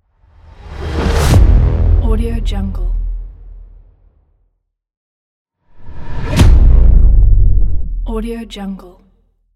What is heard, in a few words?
bass impacts